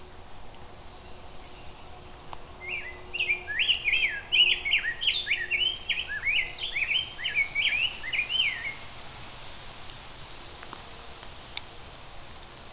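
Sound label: Rose Breasted Grosbeak; Rosebreasted Grosebeak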